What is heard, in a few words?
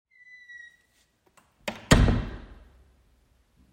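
door open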